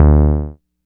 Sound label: Moog Bass; Electronic Point Blank